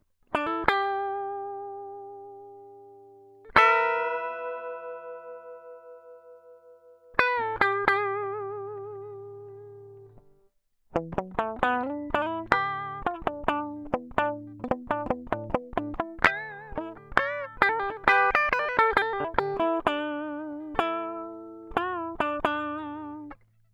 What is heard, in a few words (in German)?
Spark Lead ohne Plugin; mercuriall